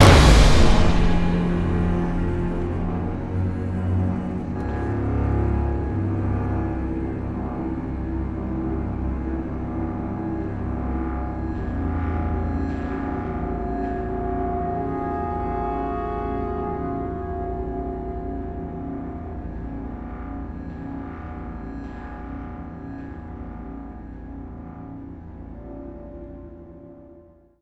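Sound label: sting, end long; transformers war for cybertron gamerip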